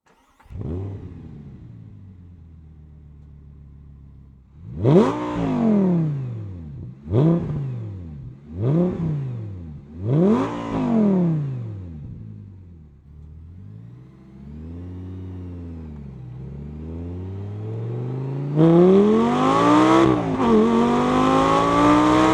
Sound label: Audi R8 V10